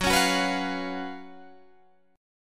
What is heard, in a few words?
Gb Major 7th Suspended 4th, down, Guitar, Open G